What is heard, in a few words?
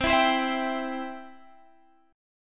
C Major, Guitar Standard; C strummed; 20,19,22,21,20,x